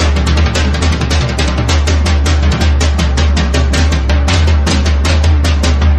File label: drums; horde